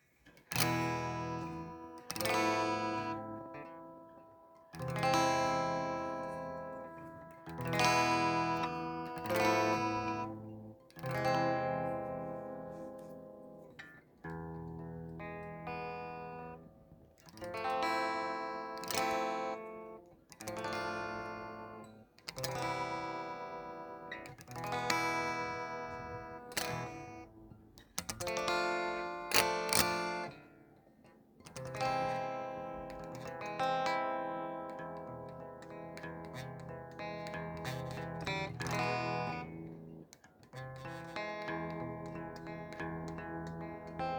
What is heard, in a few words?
E Fmaj7 E Amaj7 Cmaj7